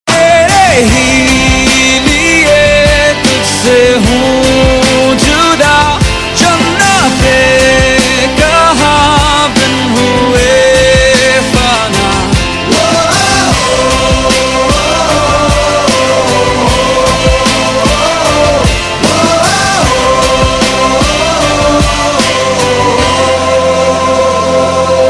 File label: Power Ballad; Bollywood